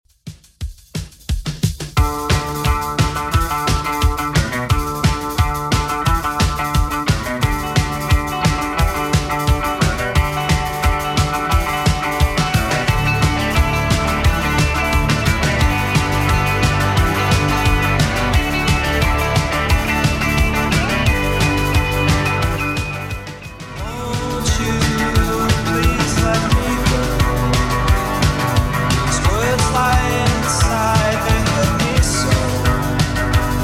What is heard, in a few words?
80s Redrum 176bpm